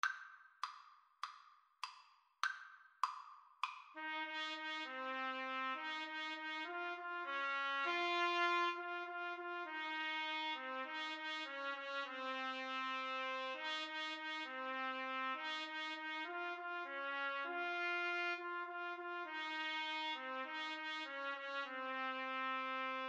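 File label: how great thou art trumpet duet karaoke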